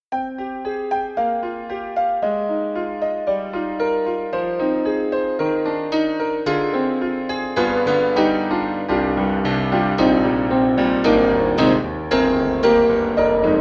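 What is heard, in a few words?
Antique Upright; Roland; SRX-11; XV-5050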